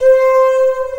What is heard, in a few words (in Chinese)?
呼 C6; 音MAD; 蓝蓝路